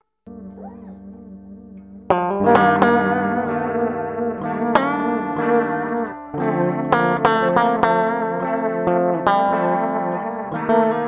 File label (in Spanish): GUITARRA